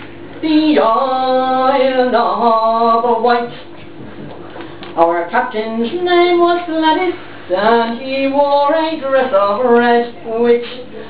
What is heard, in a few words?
pub musicians; Ireland; Connemara; Clifden